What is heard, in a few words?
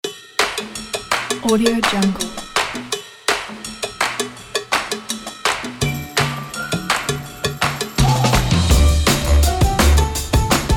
groovy soul party